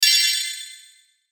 Hit High Twinkle, Hit High Twinkle Delay Fast; Blastwave

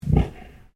Animal Pig Landrace Inside Grunt

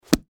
Book Hard Cover Close Fast; Office; Thuds

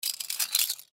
Handcuffs Pick Up; Police; Clink; Emergency

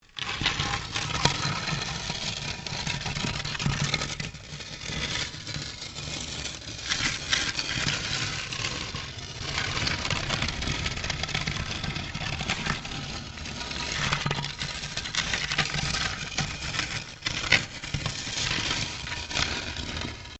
Stone On Stone Large Scrape

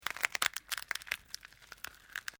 Dry Brittle Snapping Long; Various Organic Foley sounds; Human

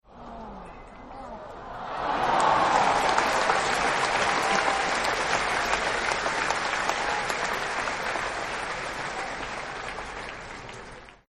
Big Cheer Disappointment; Crowds; Backgrounds; Partners In Rhyme

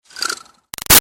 Arrow By Whirly Fast; Bows and Arrows; Combat

